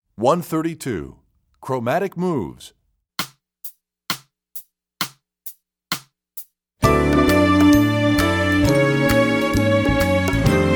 Chromatic Moves, Strings; EE2000 Book1

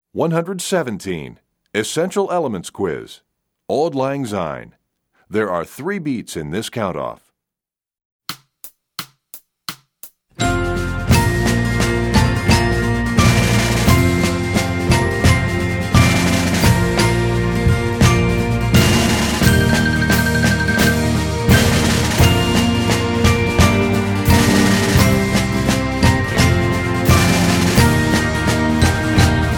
Percussion; EE Quiz; EE2000 Book1

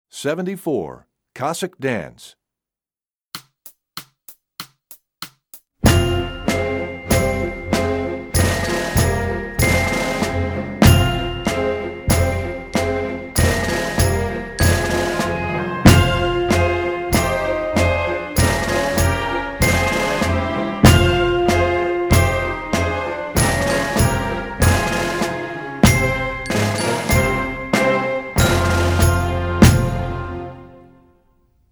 Percussion; Cossack Dance; EE2000 Book1

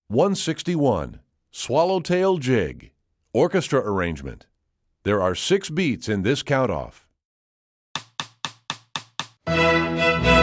Swallowtail Jig Orch Arr, strings; EE2000 Book2

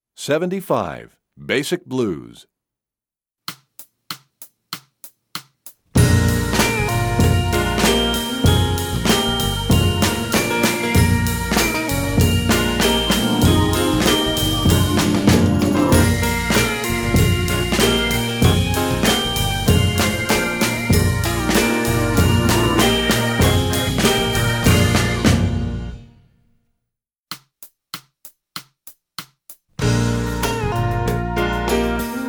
Basic Blues, Percussion; EE2000 Book1

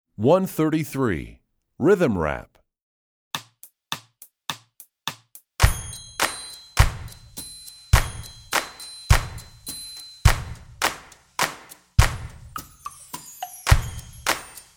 Rhythm Rap, Strings; EE2000 Book2

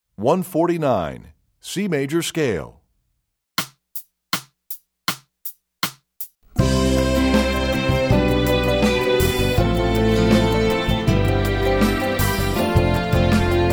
C Major Scale, strings; EE2000 Book1

